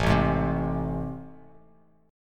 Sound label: A Minor Major 7th A down, Bass; strummed; AmM7 chord; 5,0,6,5; Bass Standard; JGuitar